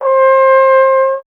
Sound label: Trombone C; Miroslav Vitous - Solo Instruments VOL-1